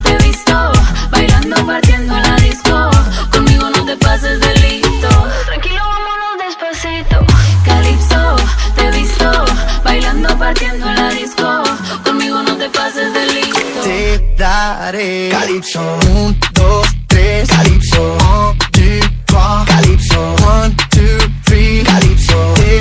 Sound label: calypso remix ringtone